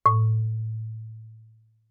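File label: Marimba yarn fortissimo A4; MIS Pitches - 2014; Percussion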